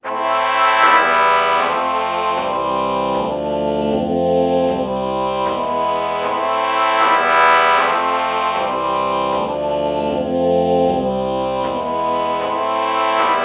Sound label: oh trumpet chord morph